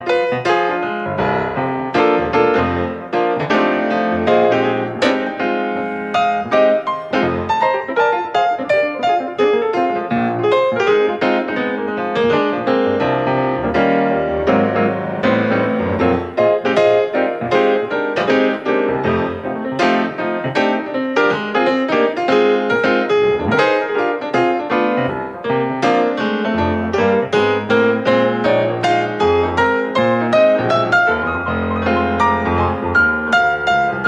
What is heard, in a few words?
Improvisation Hidden Jam